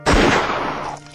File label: gun